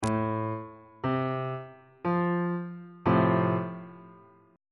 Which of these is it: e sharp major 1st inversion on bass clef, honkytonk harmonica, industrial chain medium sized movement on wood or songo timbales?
e sharp major 1st inversion on bass clef